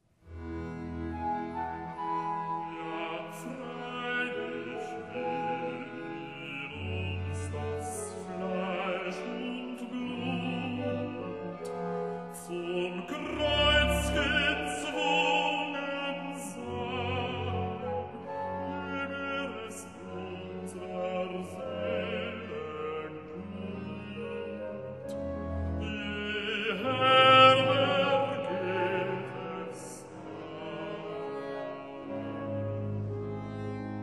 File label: recitat, bas fluit viola da gamba; bach; Ja freilich will in uns